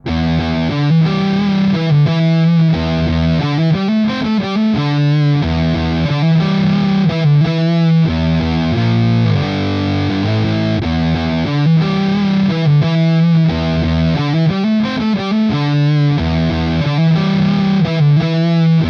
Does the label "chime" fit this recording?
No